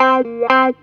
HEY, Roots Disco; USB Soundscan vol.32; AKAI

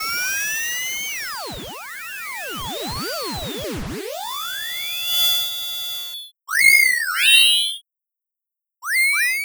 Bubble Synth, Trip-Hop Loops; Sonic Foundry (Sony Creative Software); Rondo Brothers Trip-Hop Loops & Samples; Liberation

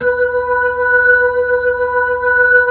B-3 Chorus

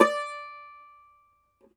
Cumbus Saz; E-MU Producer Series Vol. 7 – Old World Instruments

